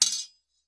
sword hit

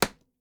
arrow, attack impact

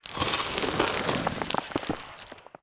gather field expire

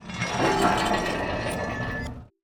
mine metal expire

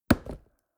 lumber tree

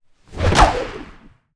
warship attack stake; ships siege

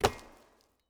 lumber tree

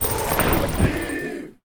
warship move

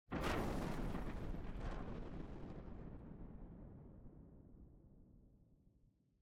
explode debris

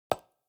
construction wood

